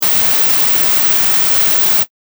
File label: white noise